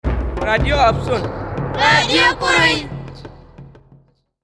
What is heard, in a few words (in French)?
Jingle Radio; Absun Iriba Tchad 2006